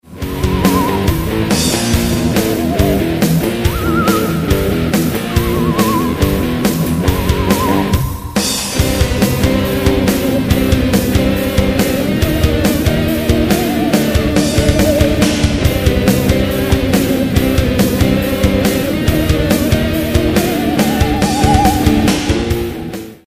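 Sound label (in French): electric guitar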